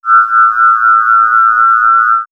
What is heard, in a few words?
Swirls, pads; X-Static Goldmine